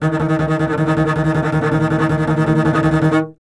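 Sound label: STR CBS, Solo Instruments, double bass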